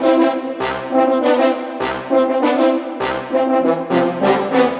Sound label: Blackthirst Brass